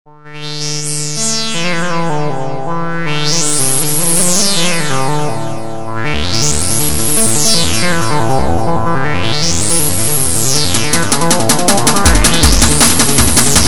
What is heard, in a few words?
techno mix ringtone; Airtel